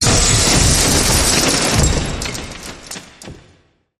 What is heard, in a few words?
Crashy Blast Impact And Debris